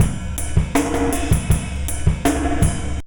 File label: Hard Ride Loop, Drum Loops Jazzy; Zero-G Smoke